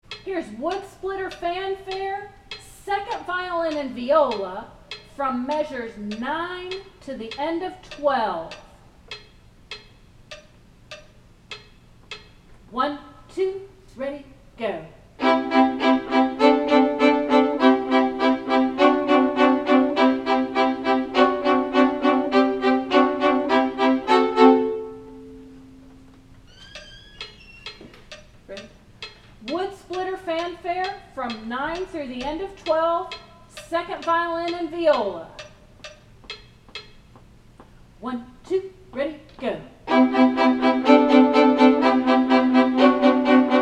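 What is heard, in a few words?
Wood Splitter 2nds viola; 2nds vla m9-12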